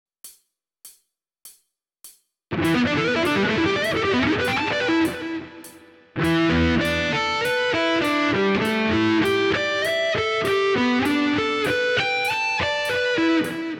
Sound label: Sweep Picking